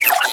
Space Port Cargo Scanned Disable Off; Venus; Objective Rooms

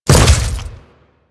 Step Goo, Footsteps; Warframe; Grineer

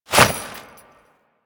Jump, Foley; Warframe; Grineer Royal Jester

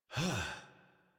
Breath Relieved, Adult; Warframe; New War; Adult Operator

